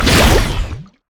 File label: hydroblast; nami base